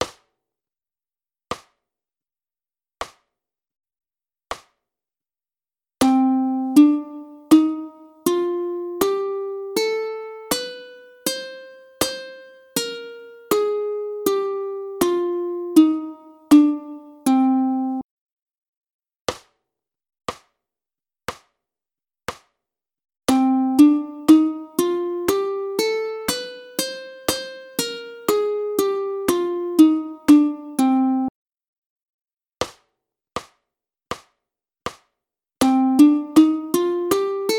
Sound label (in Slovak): ukulele stupnice melodiicka